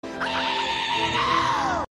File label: Scream; Kocoum